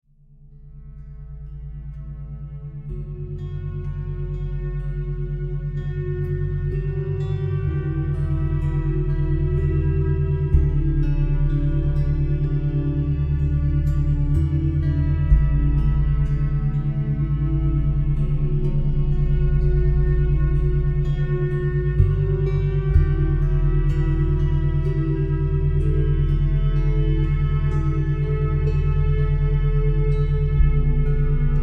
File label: Brain Hemisphere Synchronization - Binaural Beats; Activate The Entire Brain; Binaural Beats Meditation